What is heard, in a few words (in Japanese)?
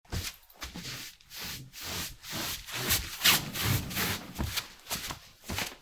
畳を歩いて近づいてくる足音; walk